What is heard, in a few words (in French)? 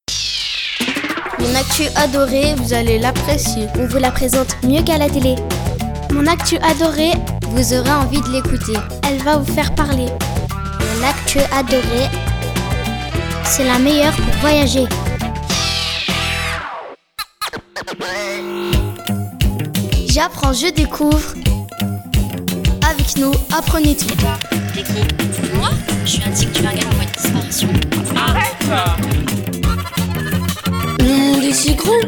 jingles montage des trois